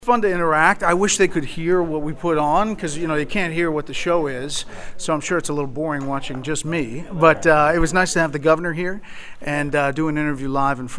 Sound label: live audience; Brett Baier